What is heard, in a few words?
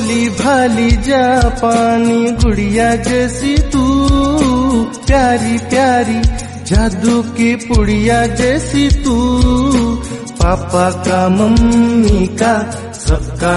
Sister Birthday Song Hindi